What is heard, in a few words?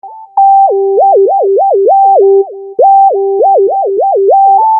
Keyboard